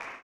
CLAP, Blues Soul; Spectrasonics Vocal Planet

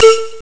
calliope; ST-XX Amiga Soundtracker Sample Packs

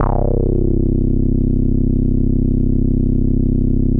Bassnote, Prototechno; Sonic Foundry (Sony Creative Software); Basses

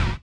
collision; Spectrasonics; Metamorphosis

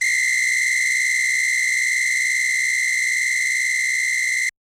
Yamaha DJX 2B, Samba Whistle L

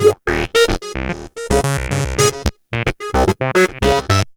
acid sequence; Sonic Foundry (Sony Creative Software); Ugly Remnants VOL-2

